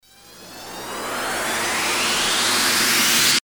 RISER

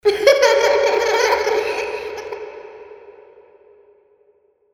HALLOWEEN CHILDREN LAUGHING DELAY

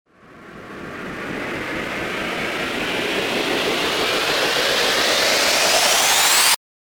RISER

